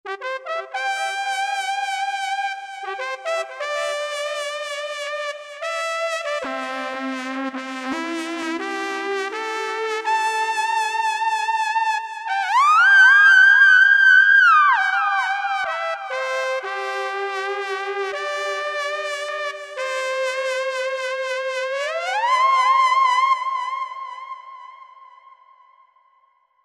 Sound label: Shaking Brass, Roland V-Synth; Factory Patches